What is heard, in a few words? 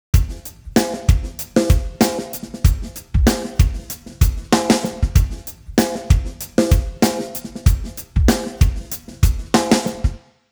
drums 110; timestretch; celemony melodyne